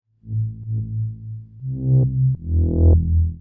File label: SNTH BASS TEKNO 140 A